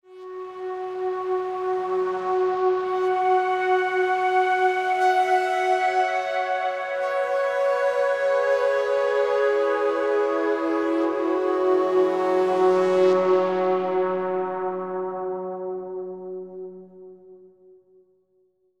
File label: Synth F# Long; torchlight iii; ps4